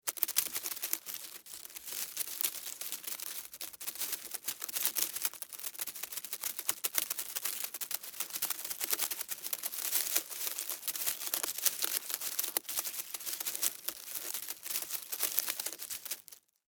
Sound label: Insect Walk Tree, Insect, Walk, Tree, Foliage, Movement, Bug; Sound Effects:Animals:Insects; Blastwave